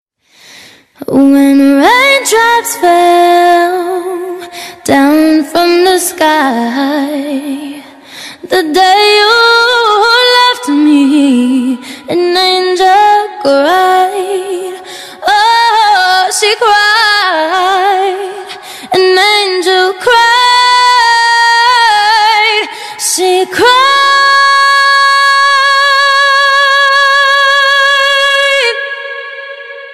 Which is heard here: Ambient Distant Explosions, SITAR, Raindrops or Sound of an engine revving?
Raindrops